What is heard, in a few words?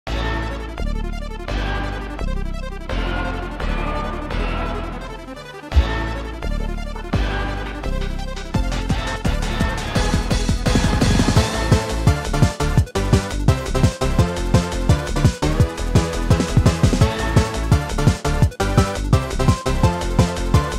disco; champion-island